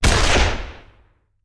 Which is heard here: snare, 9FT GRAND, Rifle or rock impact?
Rifle